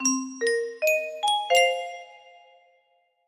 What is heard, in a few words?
melody